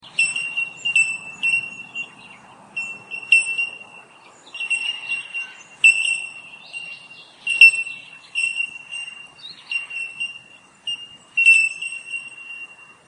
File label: bell miner